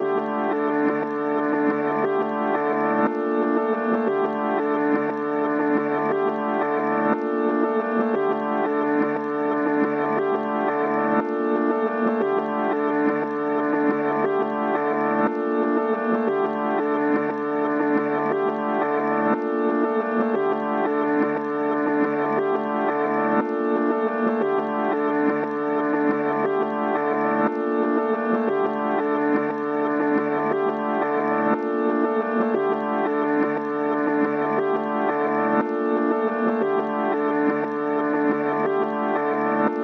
118 BPM D Min Main Synth; Melody; Melodies; Loop Stems; Cymatics; 2022 Melody Mystery Expansion; Sacrifice

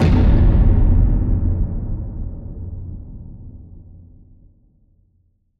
Future Bass Impact; FX

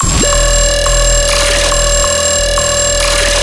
Bass Loop E 140BPM, Dubstep; AC2024 - Day 13 - Dubstep Sounds 2025; Glory Bass

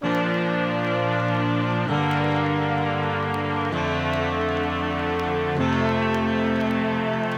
Classic Melody Loop 130 BPM D Min Brass; Melodics; Loops; Loop Stems; Cymatics; Eternity

